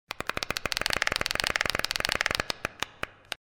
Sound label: roulette spin